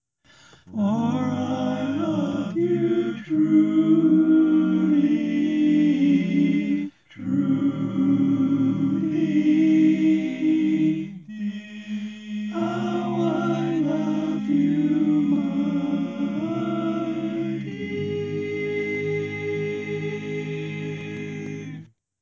Tenor, barbershop tag

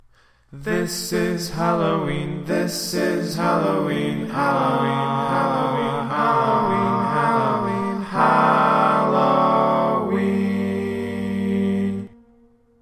All Parts, barbershop

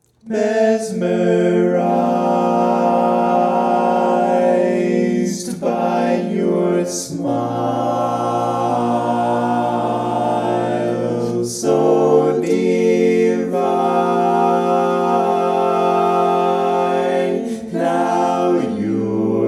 Bass, barbershop tags

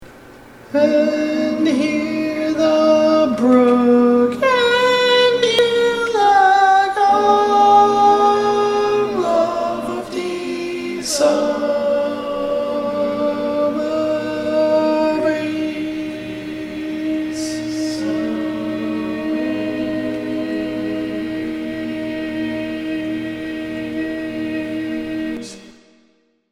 barbershop tags

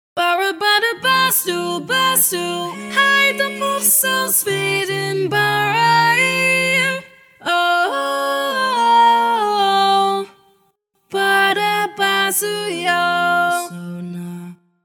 SSAA Lead, barbershop tags; Each recording below is part predominant - one part louder, other parts quieter.

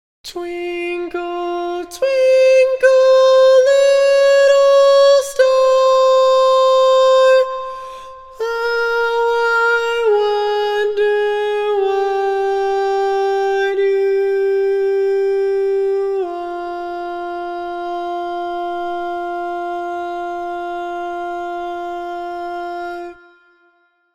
Tenor

